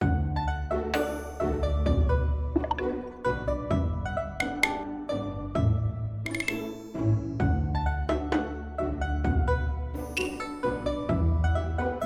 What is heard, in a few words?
bgm paidspin